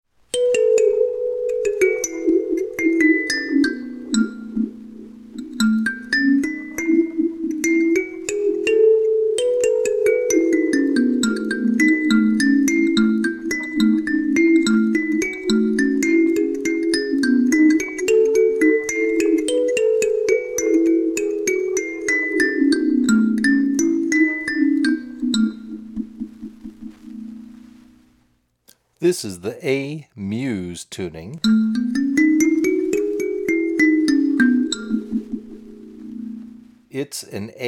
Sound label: Olive Tuning, Olive-9 Kalimba in "D" Dorian Tuning; Magadi Olive-9 Kalimba; Dorian is a minor tuning, but has a major 6th